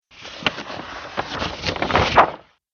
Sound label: War Map Paper Movement; Paper Sound Effects